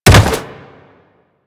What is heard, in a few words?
Jump Attack Default; Footsteps; Warframe; Tenno; Wall Run